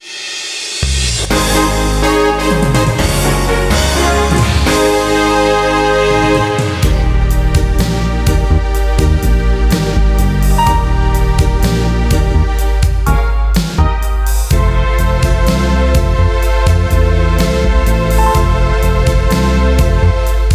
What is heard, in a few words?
victory minor